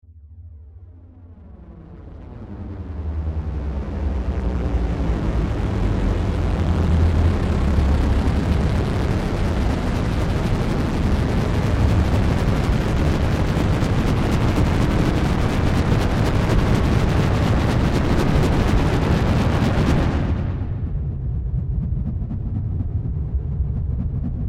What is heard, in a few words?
Spaceship Arrival, Big ominous futuristic drone as spacecraft airrives.; Spacecrafts